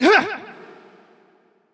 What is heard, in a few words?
Attack; Creature; Bolvar Fordragon